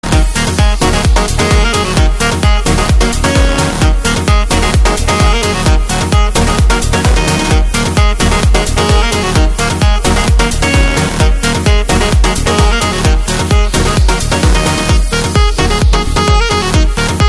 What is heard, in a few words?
Sax Power